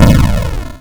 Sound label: die